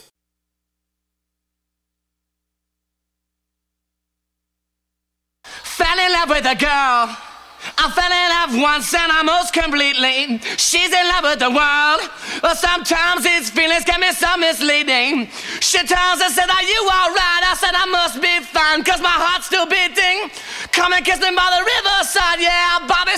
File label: vocals